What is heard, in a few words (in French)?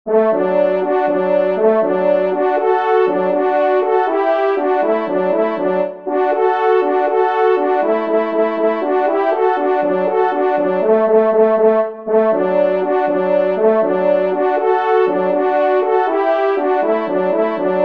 Blaireau Duo